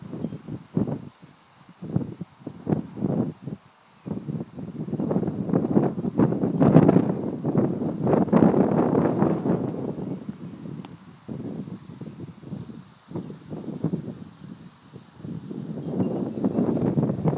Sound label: wind chimes; barrow